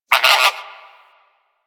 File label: Forest Creature Mid Medium; Warframe; Grineer Forest